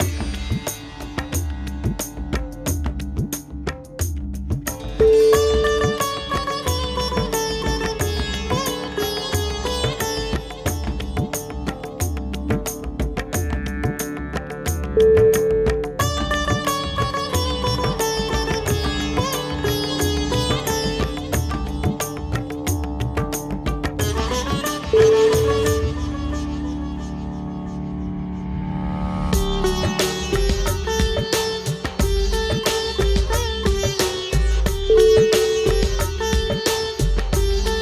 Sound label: indian spirits of sitar